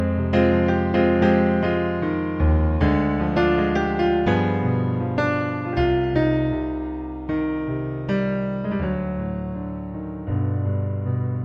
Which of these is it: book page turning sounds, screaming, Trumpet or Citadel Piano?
Trumpet